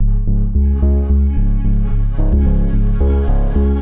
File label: Heroic Bass Arp - F#min 110 bpm; MIDI Clips; Tonal; Motifs; Ableton; Factory Packs; Mood Reel